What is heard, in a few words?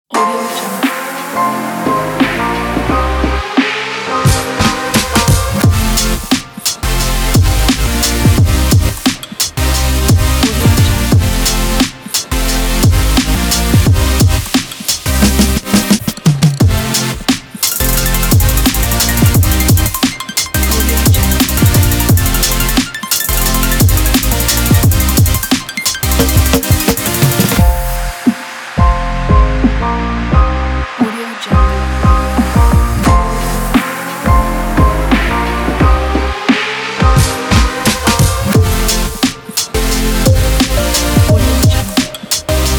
presentation hiphop